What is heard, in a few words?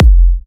F, kicks; markus hakala kicks